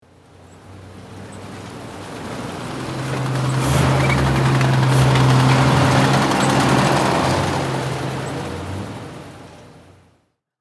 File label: Tank passing by tracks rolling